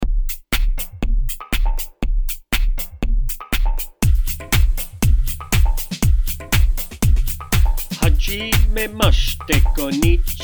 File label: rap self introduction